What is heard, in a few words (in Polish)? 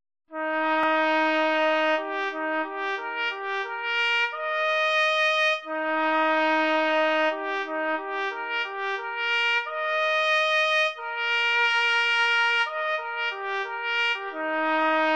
hejnal, MIDI; sandomierz